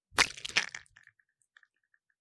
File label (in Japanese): 射精音